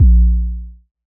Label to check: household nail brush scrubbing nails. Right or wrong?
Wrong